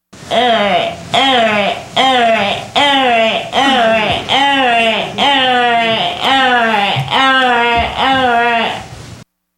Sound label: moaning